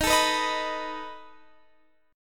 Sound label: A Suspended 2nd Flat 5th Eb, guitar